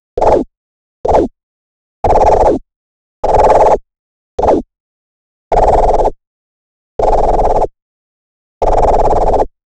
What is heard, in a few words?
quick projectile sound